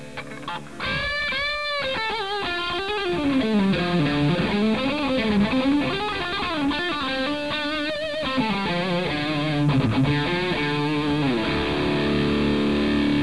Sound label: pentatonic click; pentatoniclick